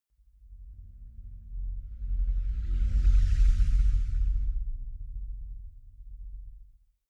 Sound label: magic spell summon stream